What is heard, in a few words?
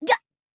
death violent, grunt involuntary; covy involuntary